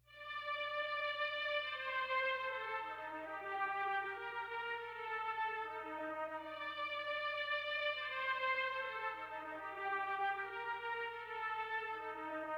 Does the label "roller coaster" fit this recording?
No